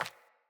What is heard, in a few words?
Sandals Run Default, Footsteps